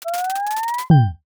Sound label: number roll stop